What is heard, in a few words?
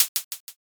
hihat